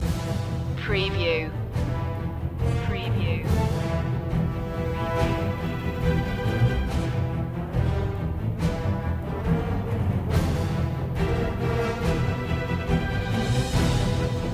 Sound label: no solo vocal